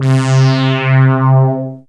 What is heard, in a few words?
synth, c, Sweep Synths; Dance Synths